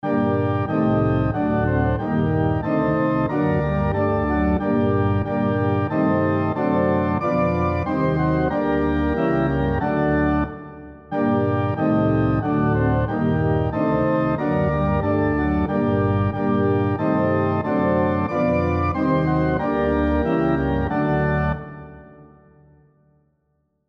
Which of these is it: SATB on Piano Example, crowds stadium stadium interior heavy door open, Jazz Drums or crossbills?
SATB on Piano Example